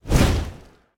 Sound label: phoenix fire; smite; npc